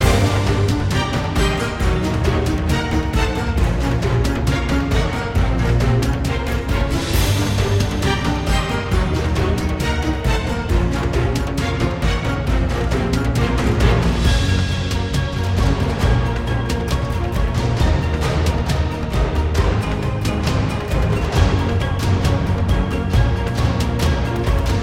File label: Cluck Battle